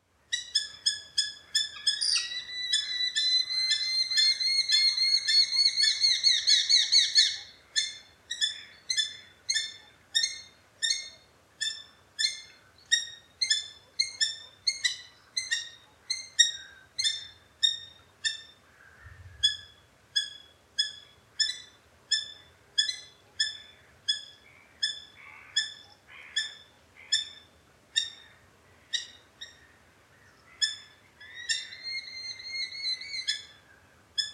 Eurasian hobby

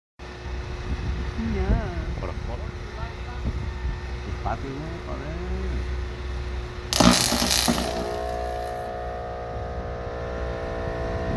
High voltage power

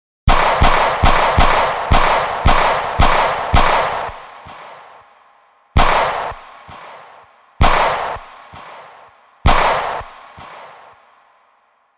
Glock, تفنگ

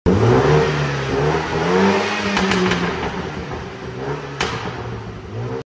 Mini John Cooper; مینی جان کوپر

